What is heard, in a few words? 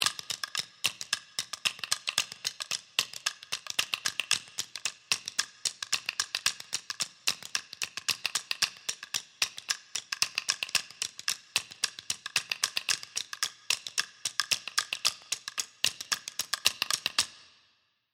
sticks; JSO elements